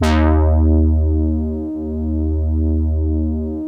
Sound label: BIT BRASS, Vintage Keyboards